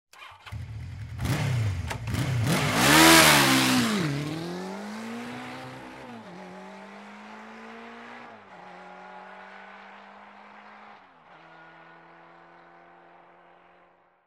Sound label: Motorcycle starts, waits, drives quickly